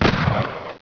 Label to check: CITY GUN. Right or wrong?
Right